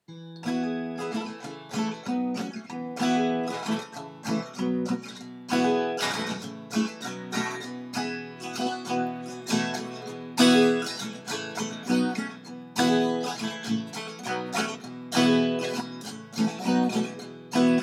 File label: Niggun guitar only